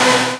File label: Synths H; Vengeance Samples